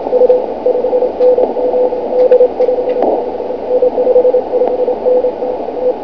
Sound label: cw